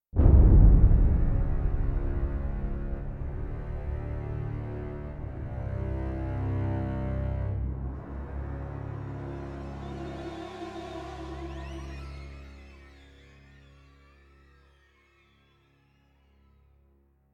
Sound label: Stinger Dark Subdued; Pillars of Eternity